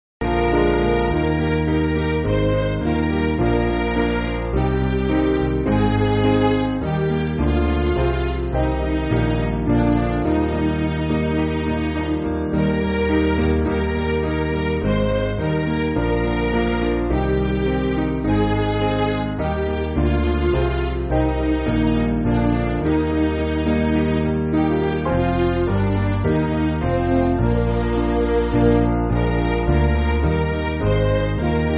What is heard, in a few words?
Lo How A Rose, Es Ist Ein Ros, Midi